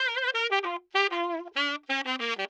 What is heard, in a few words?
OLDSCHOOL BRASS 96